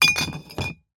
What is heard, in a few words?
foley bottle put in cardboard box with others; martinimeniscus